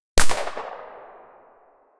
an extremely high quality, artillery

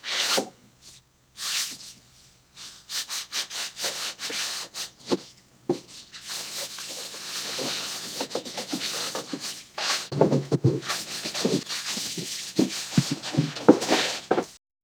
sounds of books being pla; cleaning

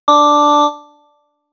interface ding

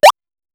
platformer 2d

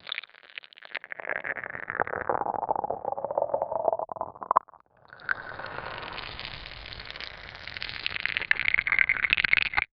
boulders formation to bec, creature

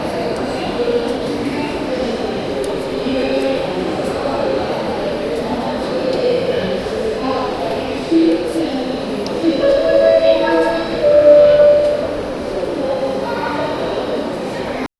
a busy airport on sunday

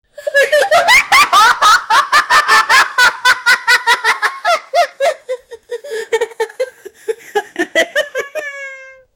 and two boys is laughing, voices

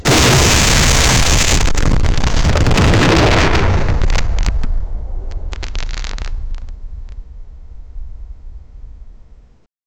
huge high voltage mechani, electrical

